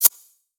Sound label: Hihat, Psytrance; One Shots